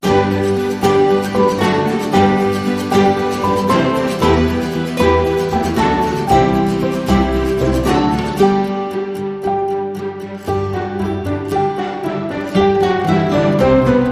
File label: Long Sting